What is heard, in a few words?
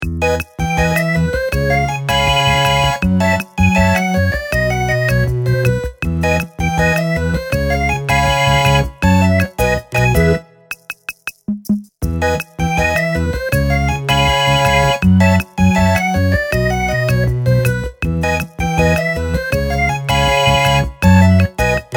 Cheesy